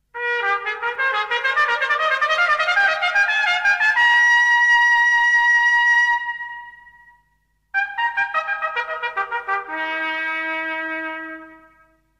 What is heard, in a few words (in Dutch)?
trompet; Welk instrument hoor je